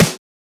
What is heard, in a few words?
Snare; FLPRO